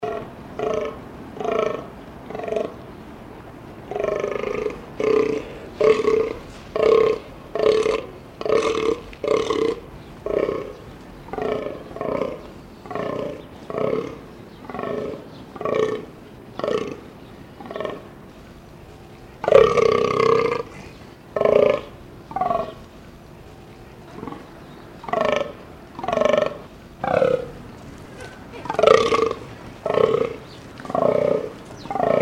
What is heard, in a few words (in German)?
Damhirsch Hirsch Roehren